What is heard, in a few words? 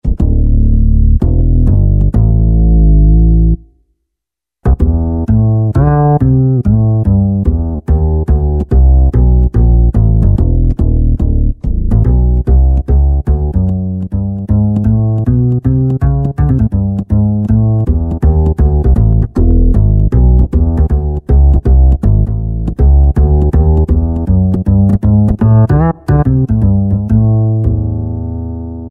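Acoustic Bass, Yamaha PSR-3000; Factory Voices; Guitar & Bass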